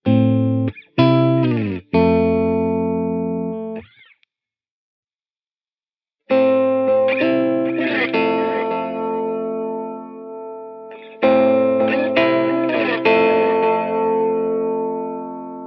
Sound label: Lofi; echosystem